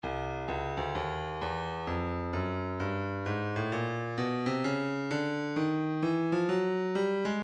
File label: breakbeat 065 bpm chill; 065 069 Bpm; Stylus Breakbeat Midi Files